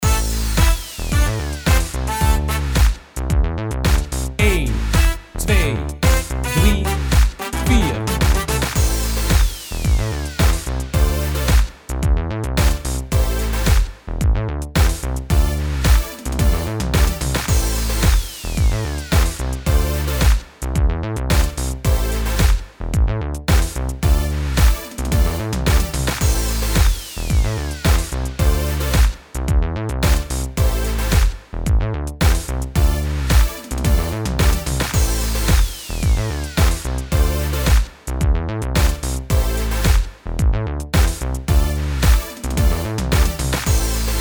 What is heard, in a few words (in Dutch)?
Tempo Level 1 3x8, bodypercussie